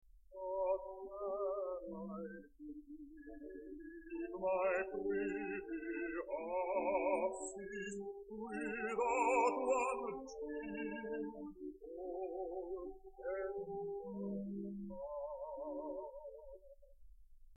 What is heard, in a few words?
air